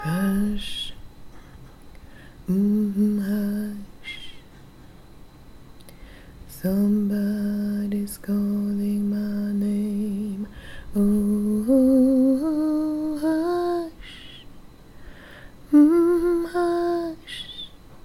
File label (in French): basse